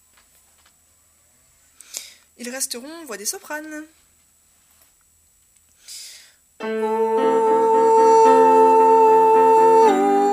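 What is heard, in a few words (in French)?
soprano, chorale voix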